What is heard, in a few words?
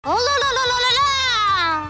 boo